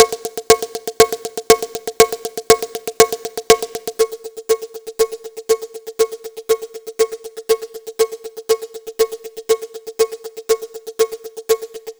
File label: ensemble